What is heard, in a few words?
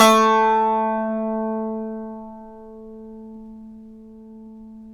SAZ A 3; Spectrasonics; Hans Zimmer Guitars VOL-2